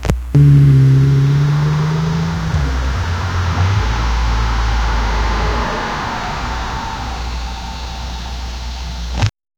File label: interior car night drive, electronic beep